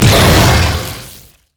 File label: bramble zone splash; LoL; zyra